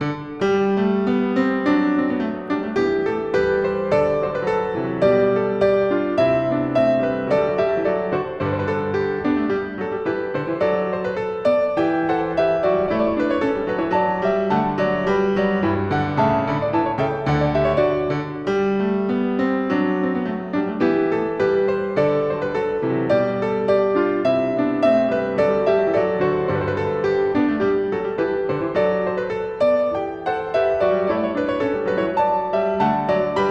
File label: a 1 Clav. Quodlibet; Bach Goldberg Variations BWV 988 (Live)